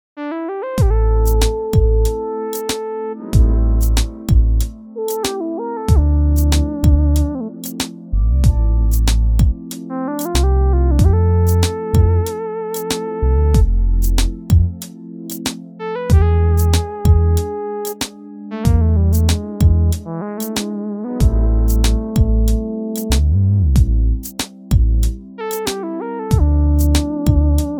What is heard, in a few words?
Cool Lead